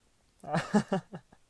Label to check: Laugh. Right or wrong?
Right